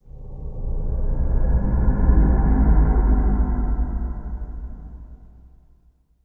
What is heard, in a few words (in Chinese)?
诡异气氛; Environment